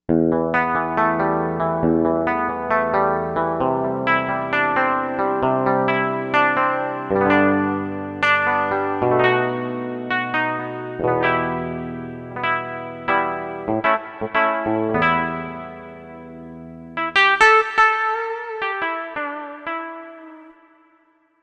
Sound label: E.Guitar, Korg M1Rex; Factory Patches